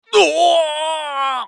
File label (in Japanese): ウィザード男 ダウン